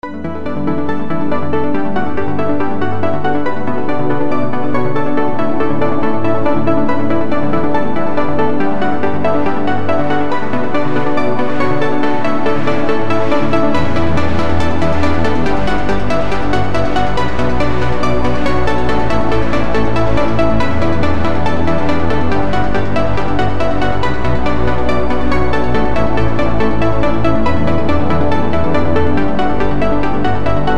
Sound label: sawpluckz